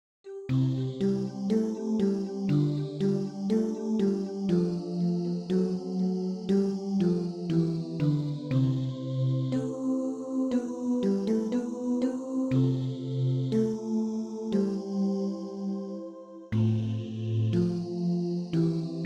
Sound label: bariton